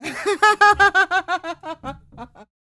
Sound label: LAUGHS; Spectrasonics; Vocal Planet; Jazz FX